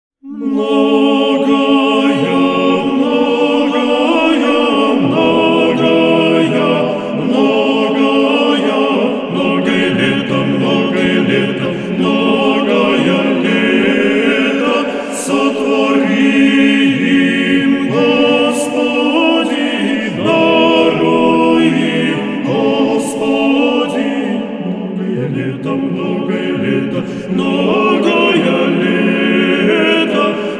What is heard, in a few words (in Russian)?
Мужской вокальный ансамбль; «Благовест»; Многая лета Патриарше